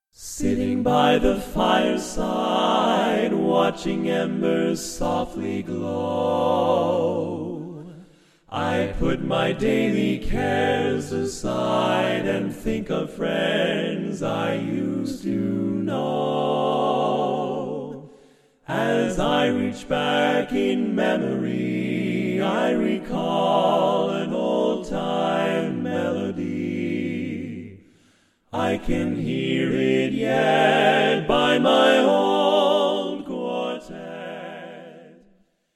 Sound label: Old Quartet, vocal harmonies